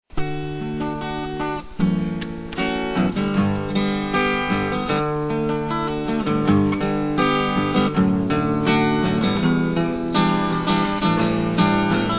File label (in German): instrumental